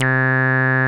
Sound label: MOOG MID C2, ANALOG BASS; Music Store - Claudius Diemeter Schwerlos